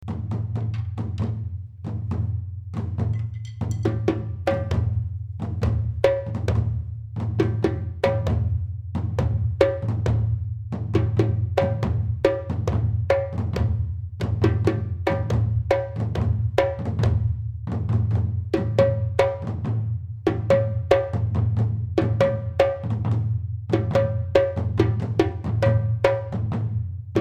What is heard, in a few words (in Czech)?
djembe a duny